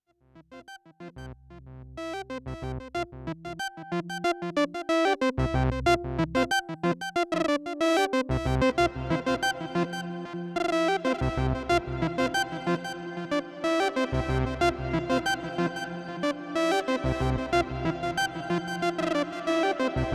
Synthesizer Reverb Hall Immerse MK II

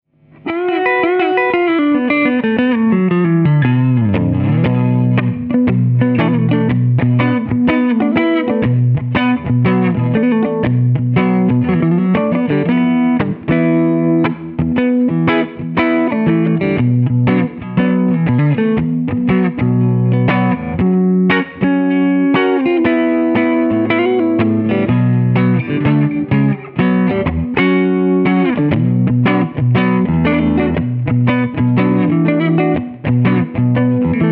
bluesey

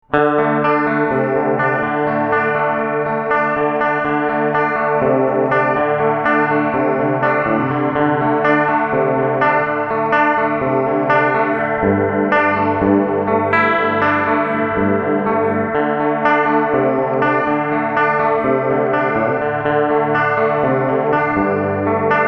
Detune

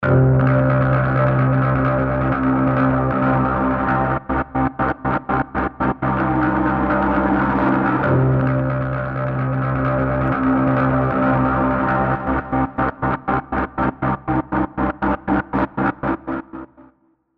Acoustic Guitars Pads FX; Scoring

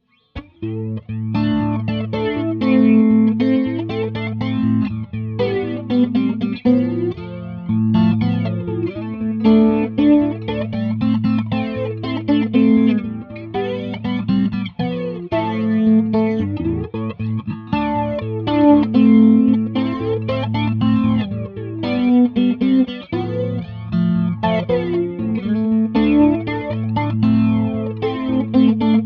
Flanger

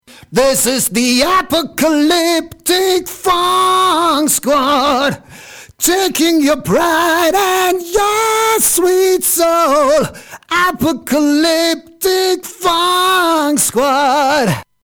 Vocals Male Overdrive

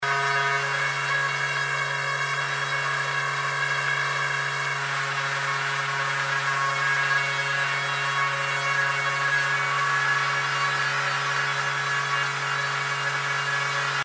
String Theory; Ableton Operator

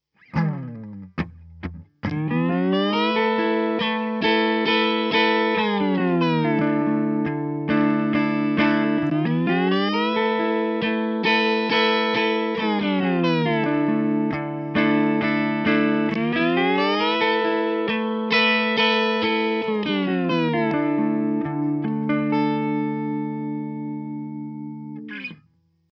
Chord 5th up and down

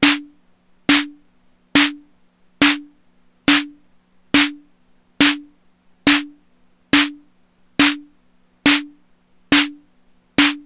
korg volca beats snare big cap

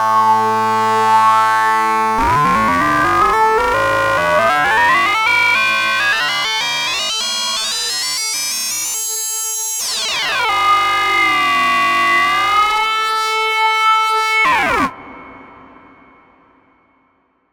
Ring Mod; Waldorf Kyra

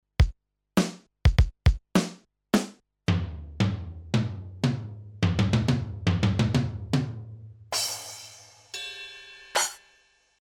Roland S-330 Drums

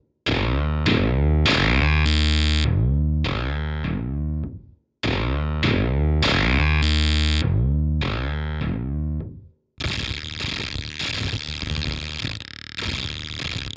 Industrialectric 4046 m Moog DFAM

